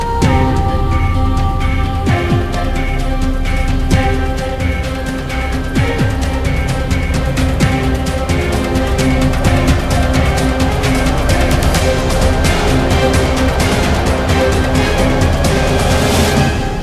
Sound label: axis music; dod bluelg